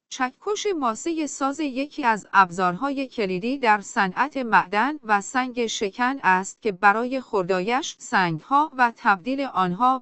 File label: sand hammer; rabbit sand hammer